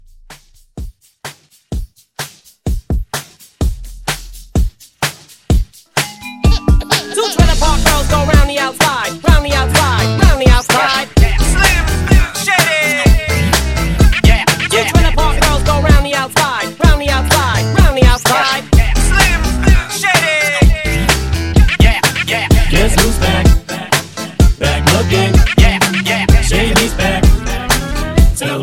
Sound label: Hype Scratch Edit 127